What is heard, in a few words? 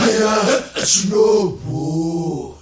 chorus hi ya heh, African Vocals Sung